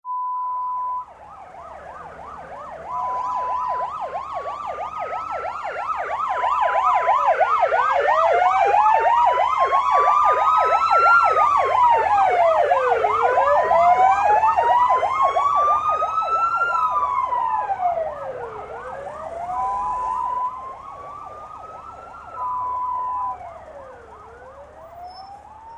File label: British police siren